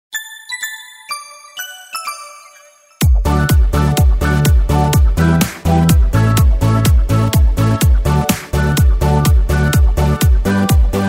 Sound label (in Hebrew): דוגמית גינגל